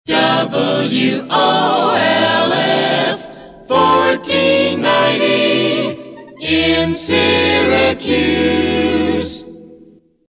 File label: A Capella Sig; PAMS Numbered Series